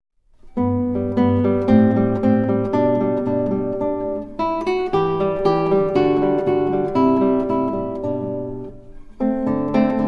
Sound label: andante, guitar